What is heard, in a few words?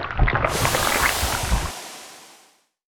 magma; weapons; dota; snapfire